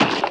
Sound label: collision; Jessie's Wild West Rodeo